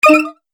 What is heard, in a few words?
Multimedia Button; Business Programs, Apps And Computing Noises; Andrew Fleming